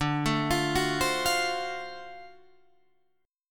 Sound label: D 7th Sharp 9th D down Guitar Open D